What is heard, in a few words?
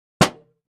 Aluminum Pan Impact; barrels buckets & cans